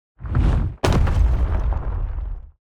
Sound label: Bone Golem Earthquake; TANK; 3Souls